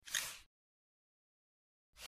Short Squeaky Mouse

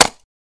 Staff Break Quick

